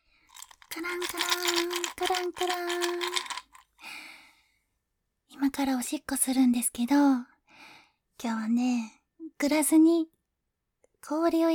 グラスに 氷を入れて オシッコですッ

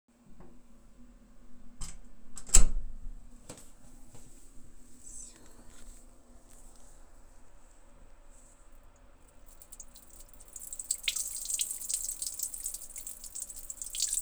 おしっこ おひるごはんあと

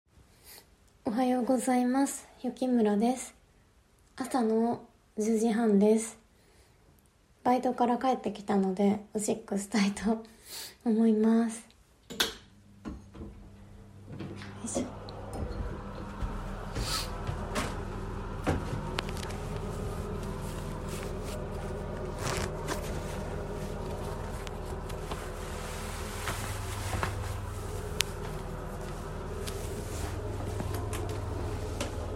おしっこ実演 バイトから帰宅後にトイレでおしっこ編; おしっこ録れるもん。

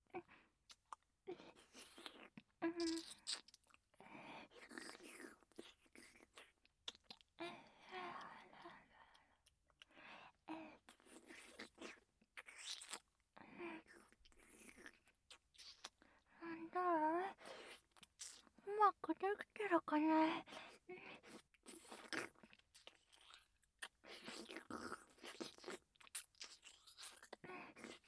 フェラチオ; SEあり

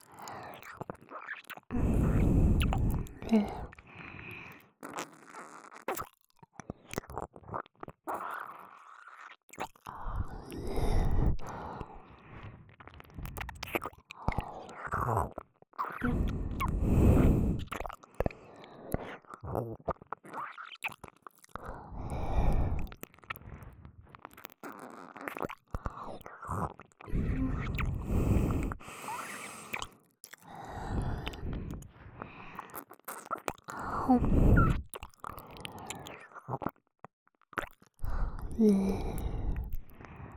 左耳舐め

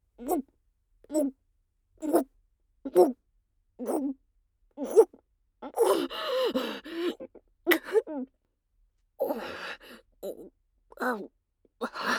オホ声喘ぎキス

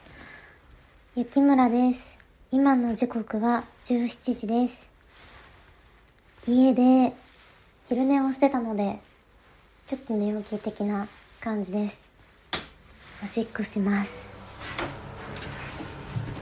おしっこ 実演 トイレ で おしっこ; お昼寝のあとにトイレでおしっこ編

